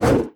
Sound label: Hit